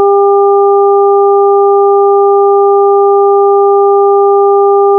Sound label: wave 400Hz phase shifted 45 90, ③の波形の2倍音を45°、3倍音を90°遅延させた定常音